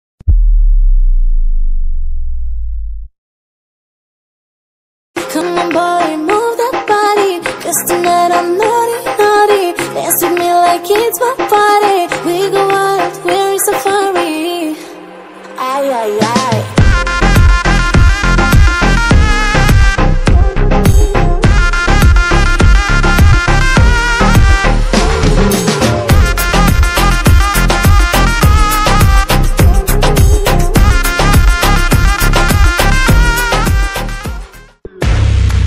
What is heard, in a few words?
english lady ringtone